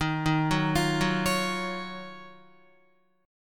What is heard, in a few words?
D sharp Minor 9th, Guitar; arpeggiated